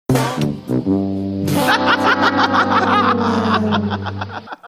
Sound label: laughing to price is right losing horn